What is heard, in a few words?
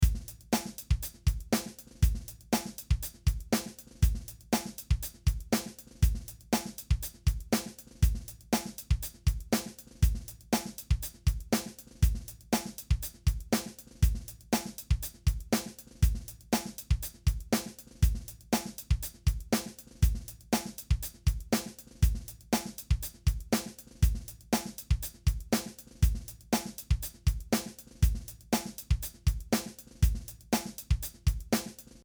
Drums, Dry; mutator demos